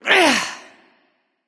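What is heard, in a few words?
grunt lift, female; smite; voicepack; hera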